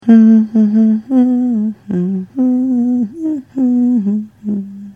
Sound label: humming